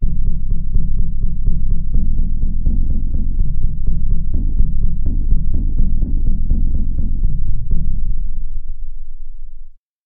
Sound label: taiko drum bass